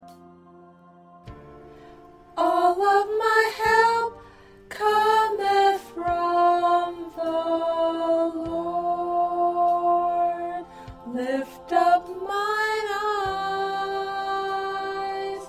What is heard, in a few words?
1st Alto